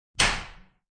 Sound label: Door Latch, Snapping shut a latch on a door.; Latches; Objects